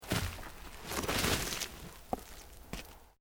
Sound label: Earth Loose Move Short